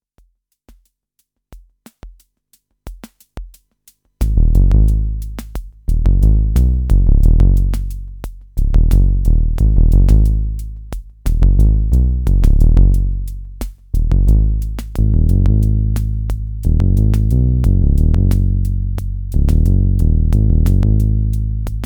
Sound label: MiniBrute SH101 Bass Saw